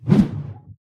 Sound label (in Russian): neslishnij silnij zamah na protivnika